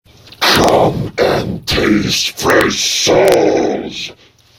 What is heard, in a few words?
summon; svencoop; custom monsters; overlordwizard